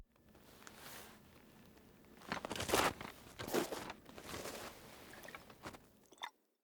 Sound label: Foley Exit; Teshin; Warframe; The War Within; Cinematics